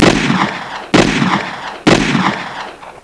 Missed; Autostart Hunter

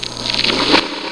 lick owl

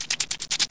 new squirrel; Bible Fun Pack; NOAH

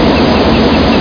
seeking

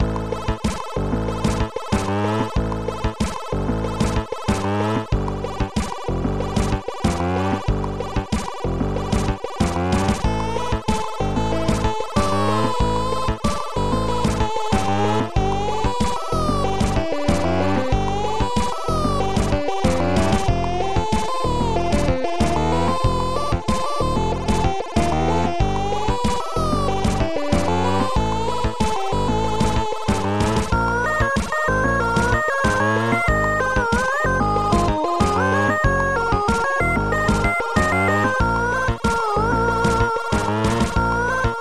techno waves; World Of A1200; synth

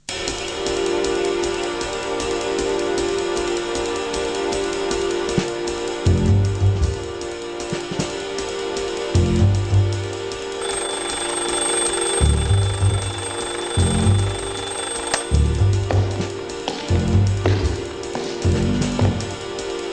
jazz

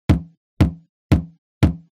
stop; Geschicklichkeit; AquaBubble2

